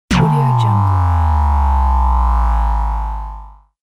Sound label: scifi force field disarm